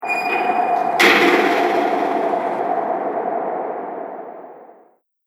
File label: Haunted House Outside Gate Slams; Ghost Sound Effects; Horror Sound Effect